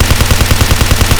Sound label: polycraft loop